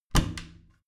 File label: bathroom cabinet warped close heavy